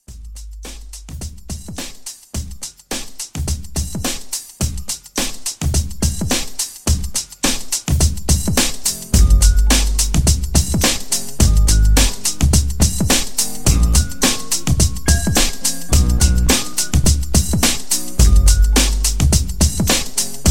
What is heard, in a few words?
90s Old School Hiphop 106